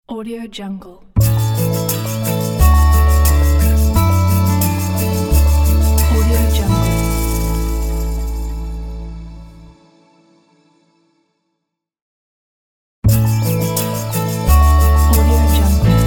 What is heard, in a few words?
positive acoustic logo